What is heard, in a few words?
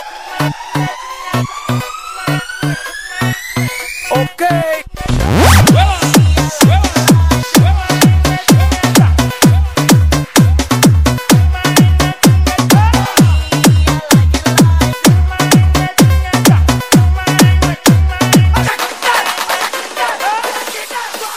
english dj mix dance ringtone